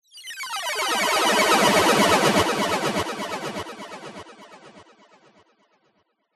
sound design falling beeps digital delay

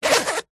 foley luggage rucksack backpack zipper fast; zapsplat

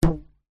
hair band pluck twang; foley; zapsplat

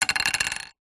plastic object hollow small down on surface; foley

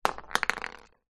beer bottle full drop concrete ground no smash

